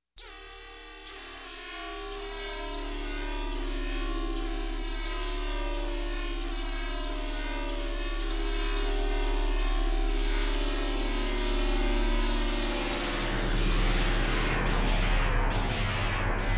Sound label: Industrial sci-fi laser